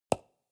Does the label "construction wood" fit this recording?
Yes